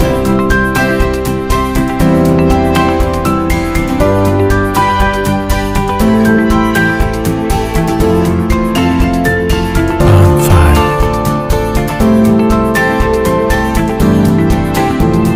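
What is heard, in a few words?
motivational and uplifting piano loop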